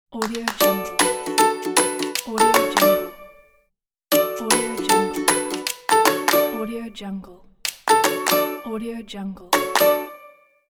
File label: kids logo